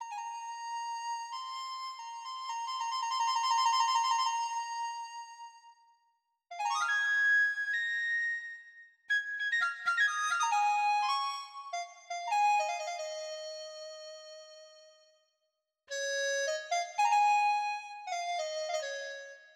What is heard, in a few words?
DiZi, piano yamaha p145